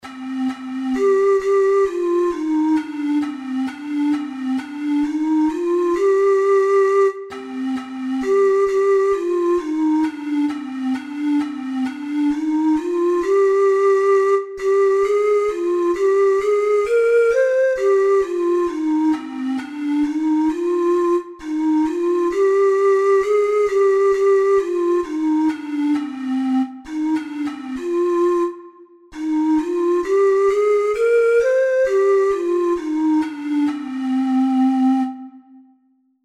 god rest, ocarina four hole

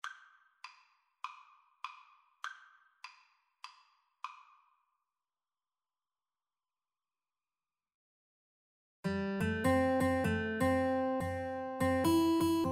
rock island line, Guitar Duet

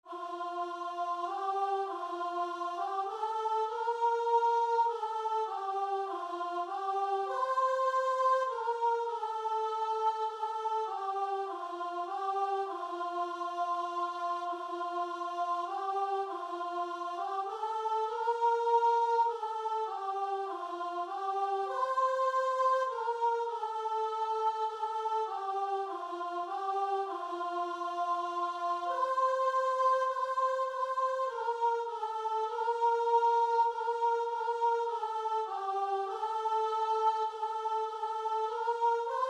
alleluia sing, guitar and vocal; Prichard, Rowland H - Alleluia, Sing to Jesus, Hyfrydol

